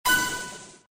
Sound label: notification crash